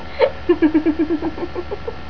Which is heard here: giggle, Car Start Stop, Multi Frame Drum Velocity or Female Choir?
giggle